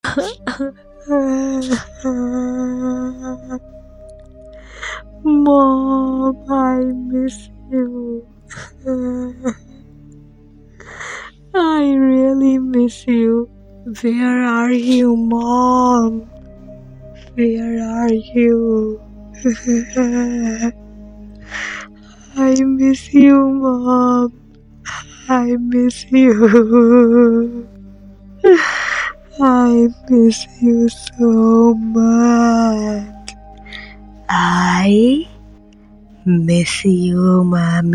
creepy girl singing i miss you mom scary horror voice